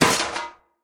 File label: Drop Thin Metal